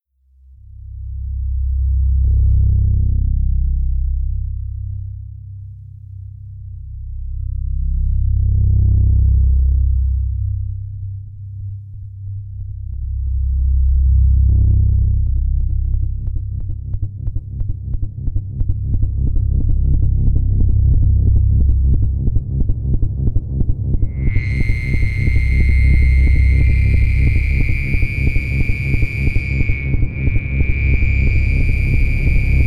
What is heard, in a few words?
Horror